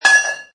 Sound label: Metal Cup Dong; Metallic